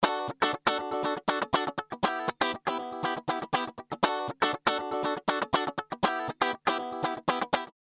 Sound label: funky dry